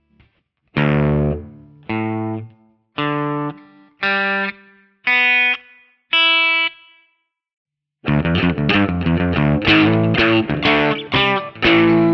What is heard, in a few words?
drop d